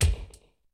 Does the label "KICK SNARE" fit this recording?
Yes